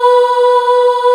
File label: Choir; East West - Global Sounds